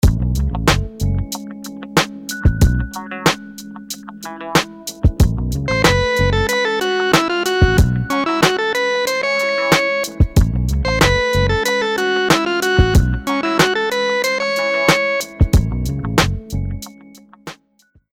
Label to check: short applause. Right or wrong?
Wrong